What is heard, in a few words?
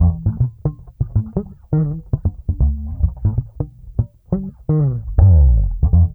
BASS, Funky Ass Loops; East West